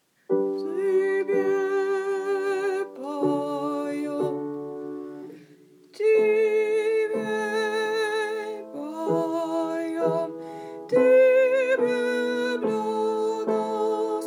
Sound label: Tibie poem basse